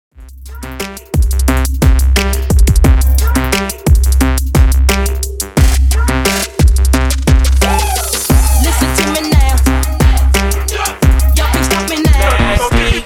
Bubblegum Redrum

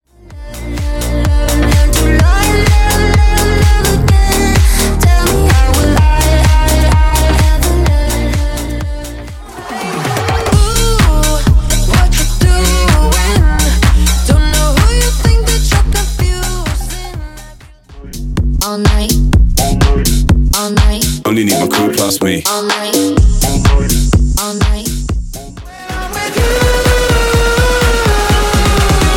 Club Extended; 10 Edit Pack 2

